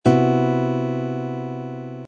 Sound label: c major seventh flat five chord voicing, guitar